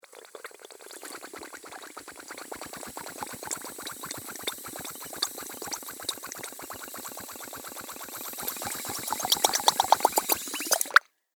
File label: Scifi, Beaker, Boil, Laboratory; Scientist, Potion; Tech Sound Effects